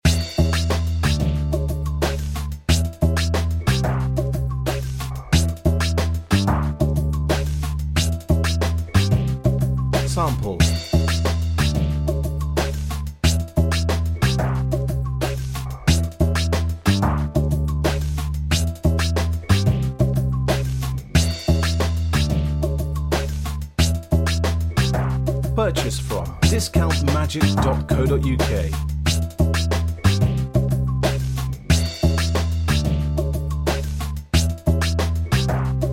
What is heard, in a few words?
squeak bass